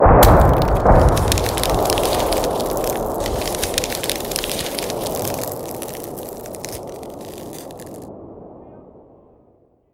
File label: Punch